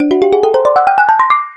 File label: music scale, notifications; Samsung; Galaxy S GT-i9008; ophone